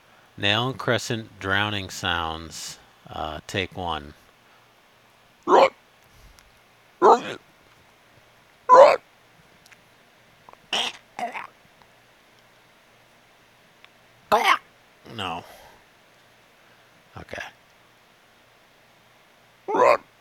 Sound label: Drowning Pain